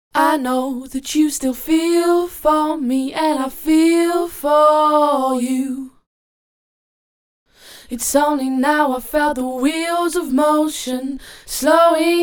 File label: 120 feelforme dbl Cm